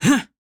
Male Jump; SandBox Musics

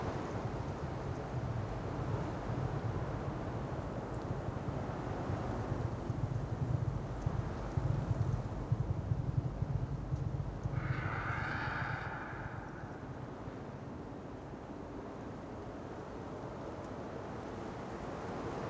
Ambiance Wild Scientists; SandBox Musics

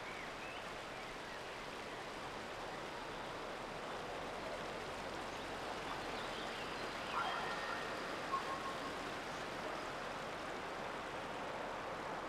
Ambiance; SandBox Musics

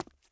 Run; SandBox Musics

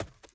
Sprint; SandBox Musics

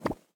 UI Pickup; SandBox Musics

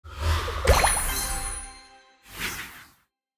Event Objective Complete; SandBox Musics

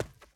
Sprint; SandBox Musics

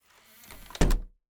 Generic Door Close; SandBox Musics

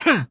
Male Attack Short; SandBox Musics

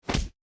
Take Damage; SandBox Musics

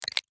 UI Accept; SandBox Musics

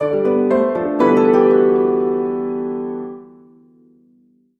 Event Game Over; SandBox Musics

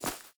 Step Run Grass; SandBox Musics

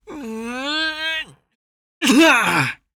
Male Attack Heavy; SandBox Musics

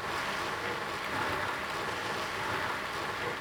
Generic Slide; SandBox Musics

